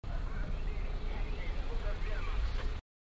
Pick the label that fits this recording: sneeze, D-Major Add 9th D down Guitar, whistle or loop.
loop